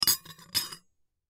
Bowl Empty Place Spoon Clank Dish; Dishware; Household; Blastwave

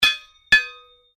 Strike Metal On Metal Heavy Ring Double; Foley sounds for melee weapons; Metal (Sound Effects:Materials)

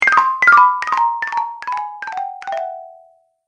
Cartoon Slow Fail; Cartoon Sound Design Sound Effects

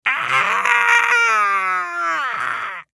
Human Screech, Screech Squeak Male Fifty Yrs; Male Fifty Year Old; Blastwave

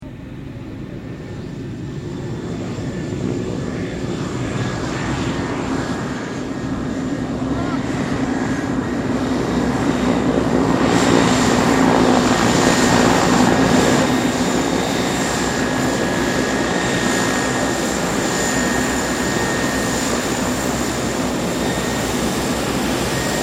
AIROPLANE MULTI PROP LARGE TAXIING; Airplanes; Vehicles

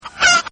Chicken, Birds; Chickens; Animals

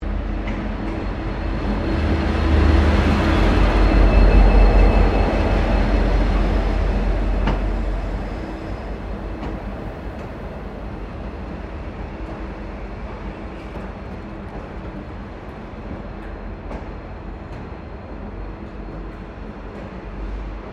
Train Locomotive Passing; VICRAIL; Various Melbourne Trains; Vehicles

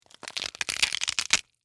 Crunch Celery Slow; Texture

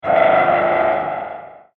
Mothership Elevator, spacecraft sound effect; science fiction sound effect

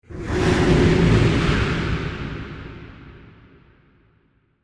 Creepy Monster Creature Transition; Scary Soundscapes; CraftedSignals

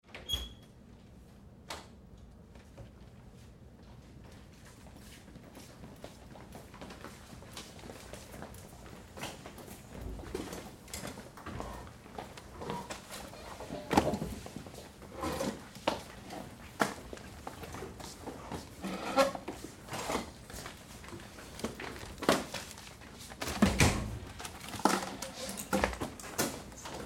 Activity Class Students In Sit Down; Schools; Sound Effects:Backgrounds; Big Room Sound

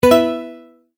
multimedia tone correct

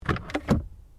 Car Ford Focus Glovebox Open; Ford Focus hire car; Cars (Sound Effects:Vehicles)

